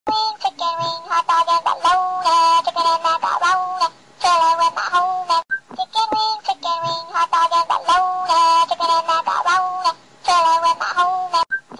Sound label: chicken song